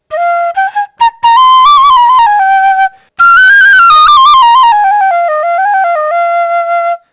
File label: flute